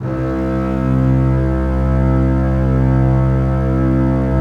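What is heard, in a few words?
basses sustain c3; Sonatina Symphonic Orchestra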